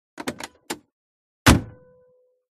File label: Car Door OC MId Size